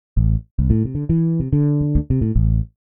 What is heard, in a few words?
picked Bass